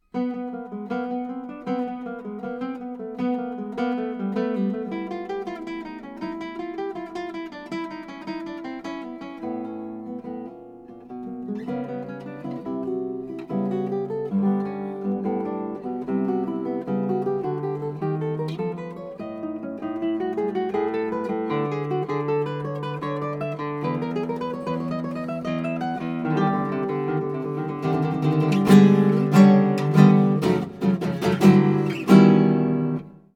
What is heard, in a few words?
courante